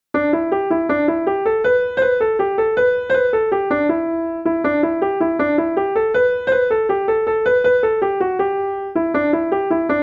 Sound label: Irish Waltz, Midi